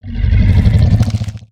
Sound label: howl